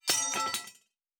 Weapon UI; Fantasy Interface; Fantasy Sounds Bundle

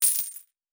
Coins; Fantasy Sounds Bundle

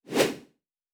Whoosh; Fantasy Sounds Bundle

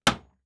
Wood Impact; Fantasy Sounds Bundle; Craft

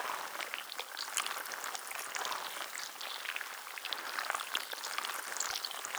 Nature Cast Loop, Magic Sounds; Fantasy Sounds Bundle

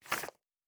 Book Page; Fantasy Sounds Bundle

